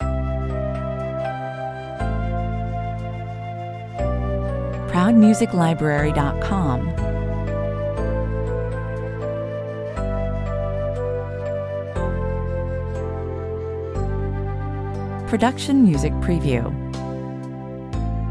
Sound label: tender heart 62 bpm